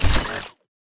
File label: footstep shuffle; Titanfall 2